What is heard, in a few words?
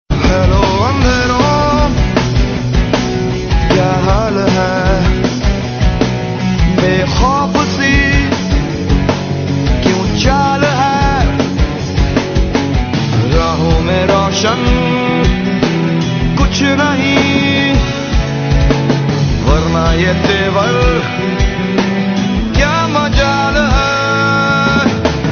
Hindi Ringtone